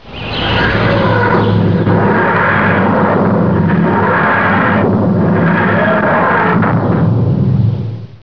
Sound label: landing; dwho